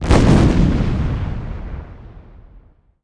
explo large

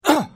fall; chekov

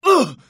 pain; chekov

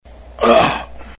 pain

